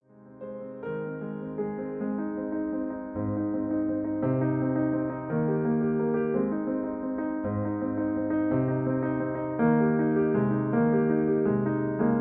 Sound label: Accompaniment Aflat